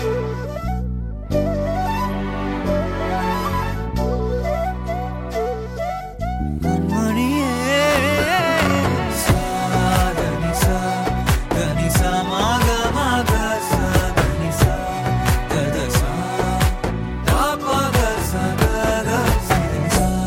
Instrumental Ringtone; Kanaa; Tamil

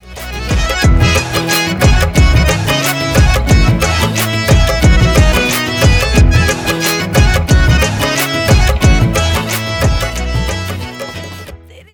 Instrumetal Ringtone; Hindi

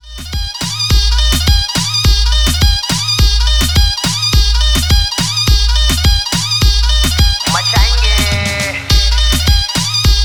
Instrumental

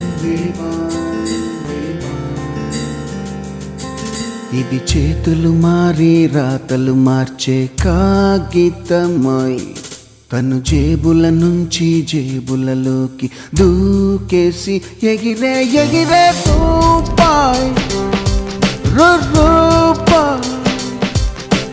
Ringtone, Telugu; Vedam

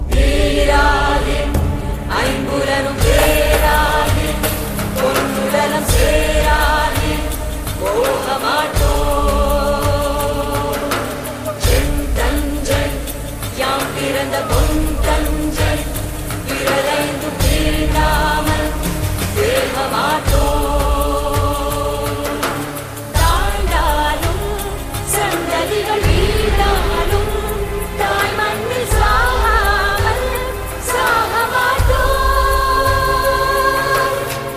Bit Music Ringtone; Ayirathil Oruvan; Cholans; Tamil

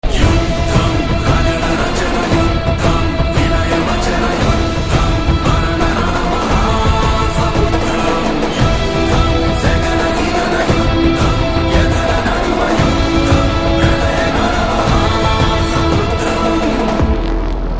Title Theme BGM Ringtone, Telugu; Maha Samudram; Varudu Kaavalenu

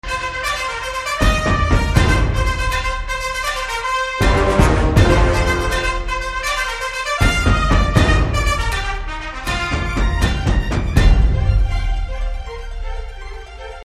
BG Trumphet; Panipat

